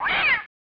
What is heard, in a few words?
Cat Screech; The Mask; SNES